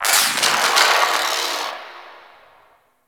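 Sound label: Distant Electricity; Ambience; Warframe; Corpus Gas City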